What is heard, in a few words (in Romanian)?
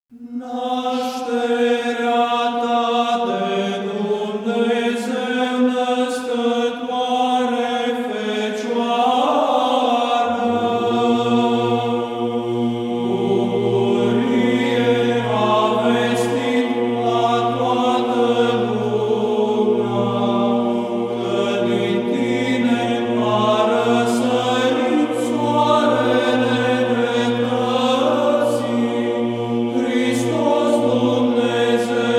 Troparul, glas 2; Aparatoare Doamna; Nasterii Maicii Domnului